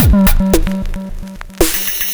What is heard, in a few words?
Mondo Bongo; Zero-G; Beneath The Planet of The Breaks